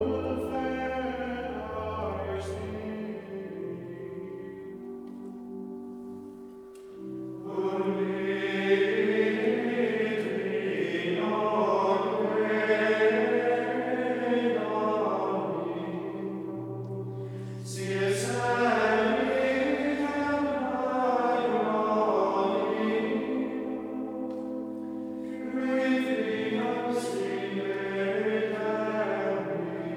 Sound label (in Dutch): Koor (mannen) Gregoriaans; O salutaris Hostia